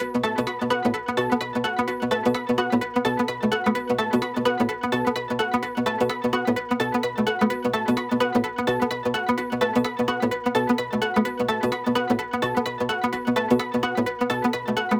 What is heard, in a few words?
Special Sounds Root B 128 BPM; Vengeance Samples; VEH3 Special Sounds